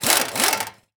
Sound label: air gun drill run; prosoundfx